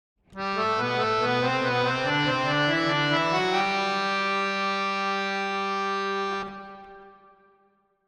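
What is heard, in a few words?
harmonium 3-4 70 verb; Melody; GM; Sample Banks; Harmonioum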